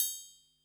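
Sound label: Drum Analog Kit Triangle Open, Yamaha RM1X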